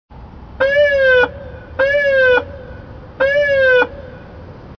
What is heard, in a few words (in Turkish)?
polis